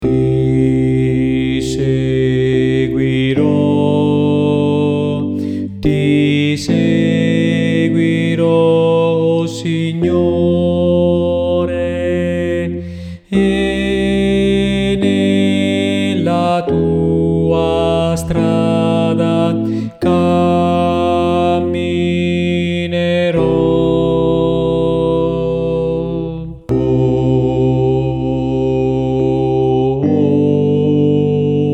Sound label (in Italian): contralti; ti seguirò